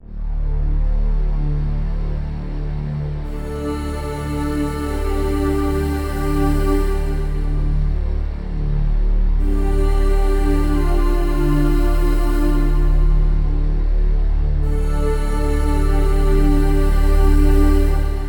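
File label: Analog Voices, synth; KORG OASYS; oasys assault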